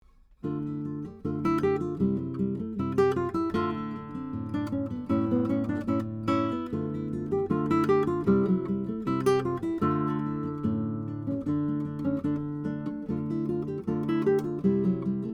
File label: Flamenco